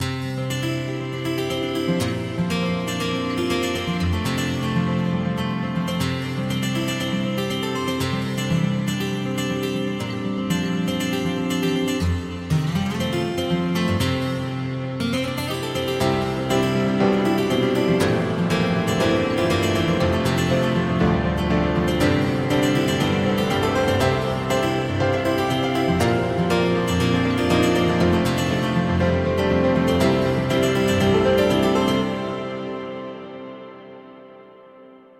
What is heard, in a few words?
Plate reverb